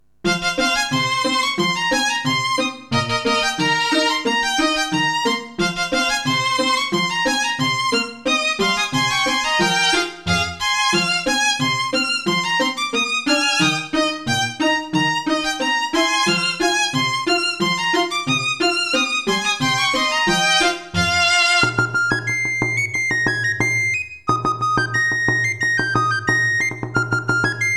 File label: Tavern; Pirates Gold